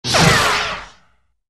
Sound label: saber bounce; effets speciaux; Blocages